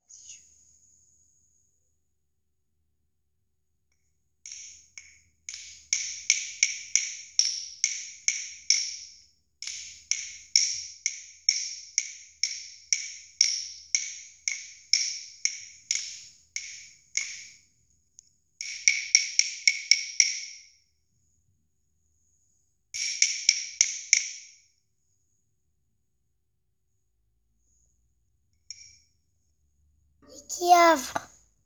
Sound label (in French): Vocal claves; vie de l'ecole